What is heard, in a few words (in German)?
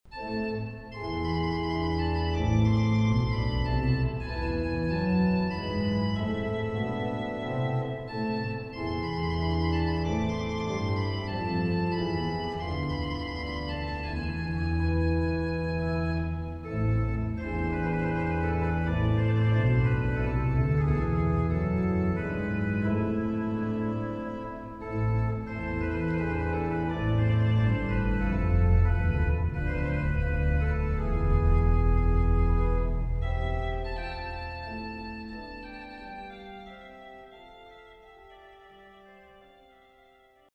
Air de trompette in drei Saetzen